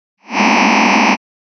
cluster closed quarter H

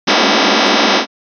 cluster open eighth

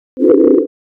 cluster sine

